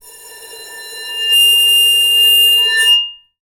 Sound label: Bowed, Large Wine Glass